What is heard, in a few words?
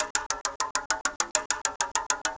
Bike Wheel, Acoustic Beats; Sonic Foundry (Sony Creative Software); Myths of Technology